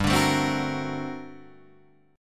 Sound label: G Minor Major 11th, G, Guitar, Drop D